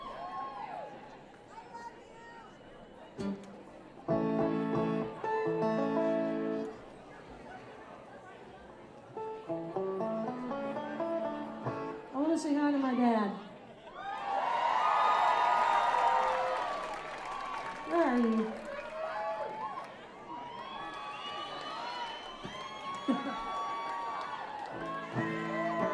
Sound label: tuning banjo problems; terminal west - atlanta, georgia